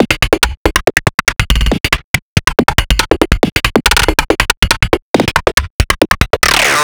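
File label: rhythm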